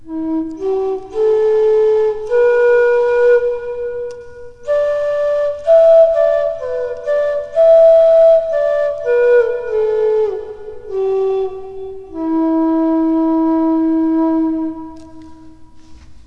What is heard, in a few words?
Em flute